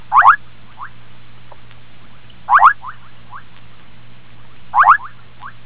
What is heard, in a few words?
running frog